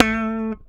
Slap One Shot A, Bass; Bass X

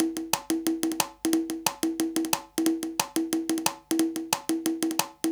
Conga Loop; Sonic Foundry (Sony Creative Software) - Fluid Dynamics Drum & Bass; Construction Kit; Percussion

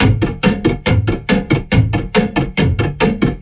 cinematic perc loop; M8; percussion and foley

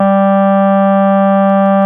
RHODES

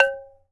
LOW CUP, Cameroon; AKAI S6000 CD-ROM - WorldSounds; CAMEROON PRC

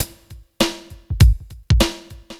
drloop, drumloops, Vinyl Attack; Masterbits

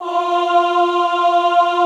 OHH F3, Classical Choir; Best Service; Extended Classical Choir

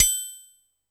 Metronome Bell, Standard Kit, Yamaha PSR-E323

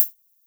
HH, Dance Drums; Best Service; Dance Mega Drums; HH HOU

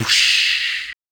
Snare Drum beatbox Snare; DRUMS; Polyend; Legowelt

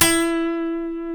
F#, funk guitars; Spectrasonics; Hans Zimmer Guitars VOL-1; TAKA FUNK

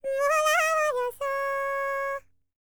HIMALAYAN, World Voices; ILIO; Vocal Planet VOL-5

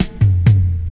TOM IN, Fills; AMG; Now CD-ROM (Roland)

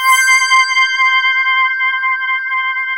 SOUNDTRAKS, SYNTHESIZER; AKAI S6000 CD-ROM - Volume 1

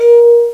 WINEGLAS, Fairlight CMI; Fairlight CMI IIX, WOODWIND

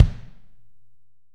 AMB KICK; ST AMB KICK

